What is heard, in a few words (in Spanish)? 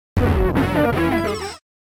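Grito, Pokémon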